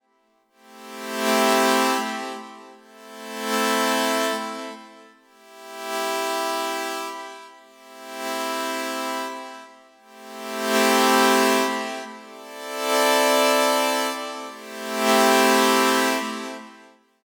Sonicware ELZ_1 Standard OSC Sawtooth

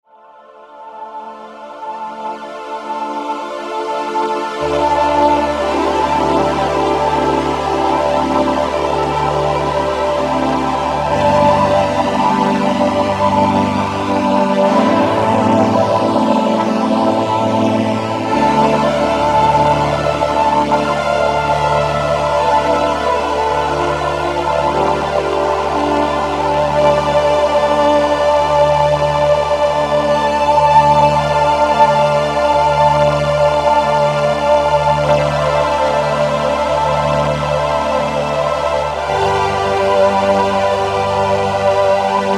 VP-330

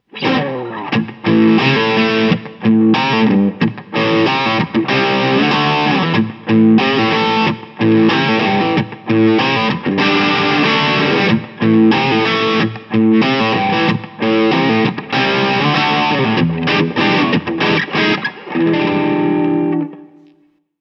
Crunch

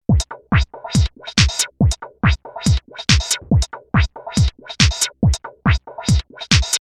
filter

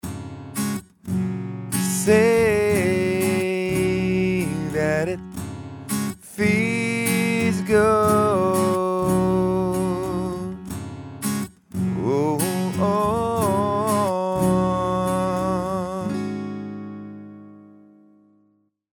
BOSS VE8 GIT ENH green Vox dry

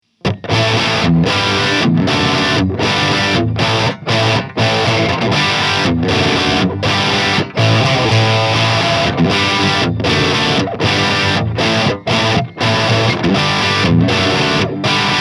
Crunch

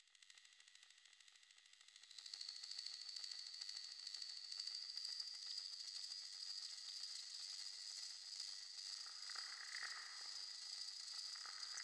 OSMOSE Geiger Insect; EXPRESSIVE E

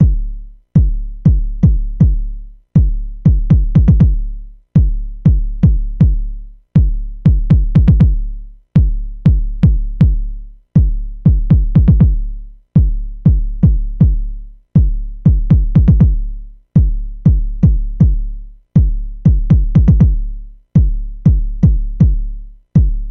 Pulse n Noise; mbase11

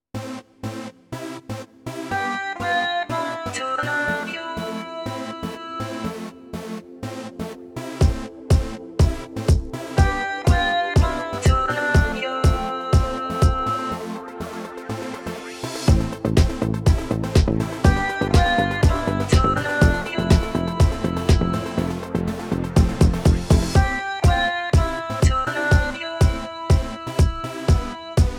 Casio CT-S1000V Vocal Style

